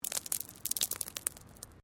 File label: Stone Small Move